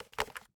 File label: Switchboard Telephone Receiver Up; Communications; 1001 Sound Effects